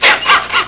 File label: laugh; Three Stooges